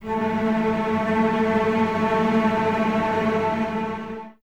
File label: cellos A 3, String Ensembles, Tremolo; Miroslav Vitous